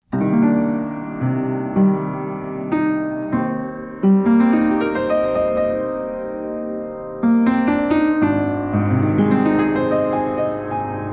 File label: PIANO BEGINNING